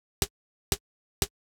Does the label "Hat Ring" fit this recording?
Yes